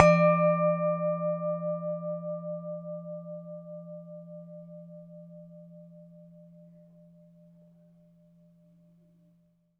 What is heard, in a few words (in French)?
couvercle; In the kitchen; 96khz 24b mono